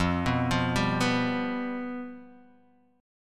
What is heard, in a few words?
F Suspended 2nd Flat 5th, Guitar, Half Step Down